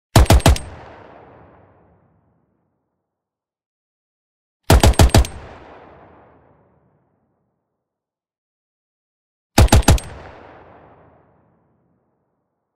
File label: SMG M3 Modern Burst; B00M